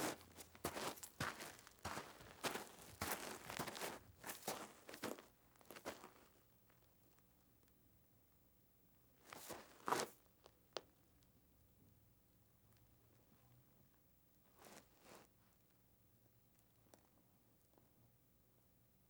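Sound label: Footsteps in Gravel Snow; Fairlight Sound Lib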